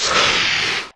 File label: moan; The Elder Scrolls III - Morrowind